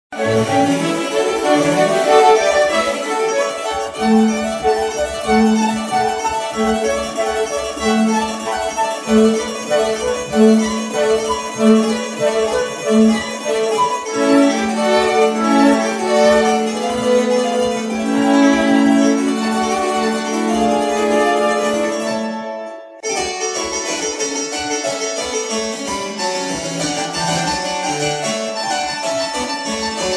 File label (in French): clavecin